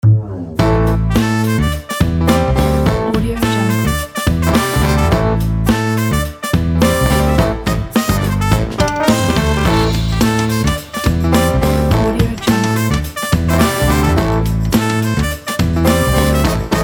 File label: funky jazzy logo